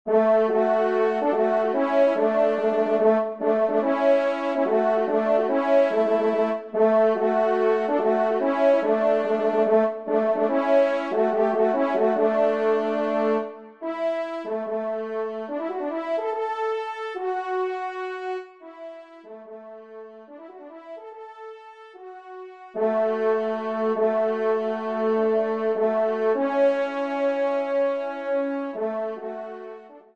trompe; Le Vieux Lapin; Auteur : L. Padof; 3e Trompe

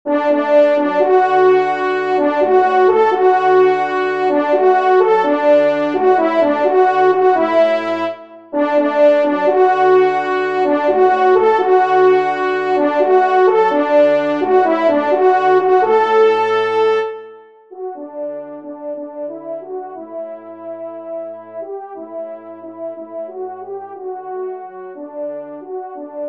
trompe

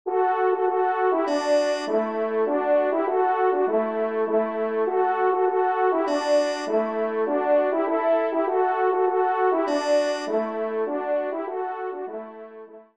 Tambourin Do Maj; 3e Tambourin; Corrette

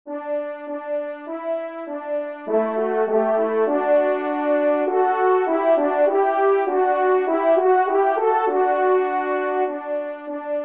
Cotillon Do Maj TRP; 1e Cotillon; Corrette

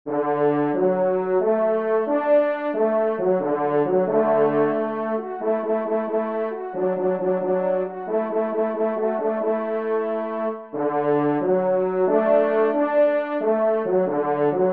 pas redouble, trompe; La Bidart

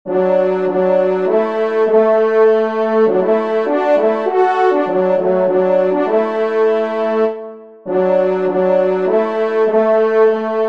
TRP; Leve Dieu; Messe d'Estival

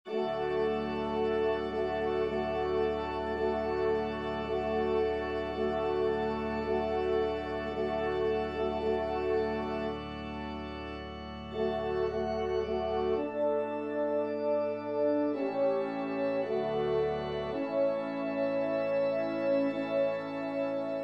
Trp Org; messe cantin

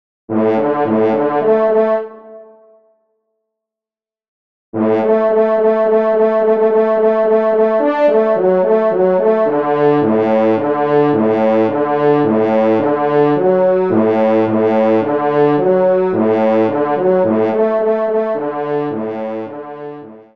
pas redoublé, trompe; Loisirs du Chasseur 6; Pupitre 3° Cor

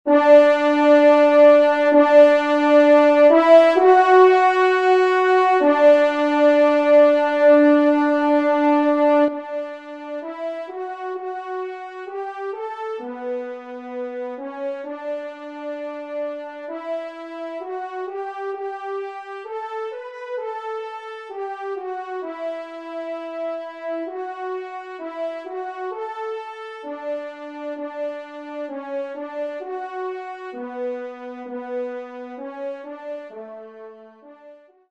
Offertoire TRP; Devert; Offertoire 1e Messe

